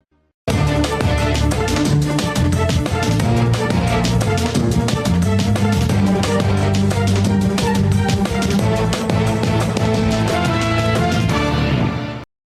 vinheta jornal; jornal da globo

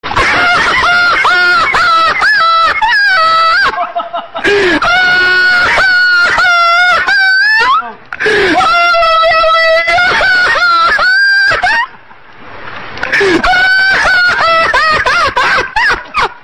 risada desafinada

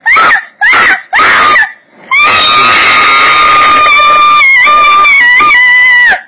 grito mortal; tulla luana

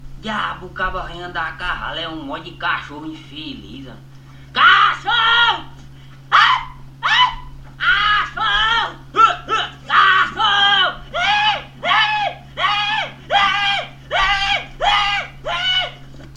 cachorro infeliz

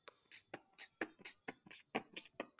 beatbox de garganta

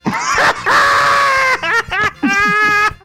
risada enfadonha

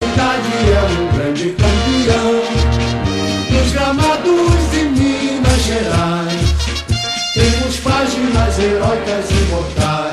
hino cruzeiro cantado

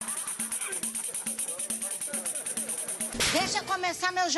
pandeiro

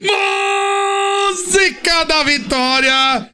muuuuusica

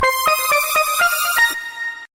toque; hora certa

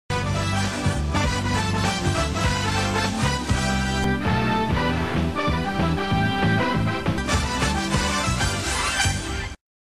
montagem vinheta

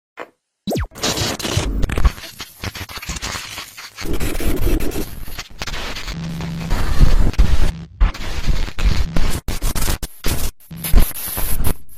tv chiando

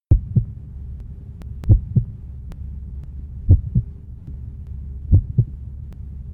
coracao batendo

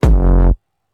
batida

